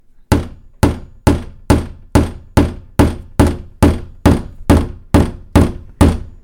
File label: Hammering Nail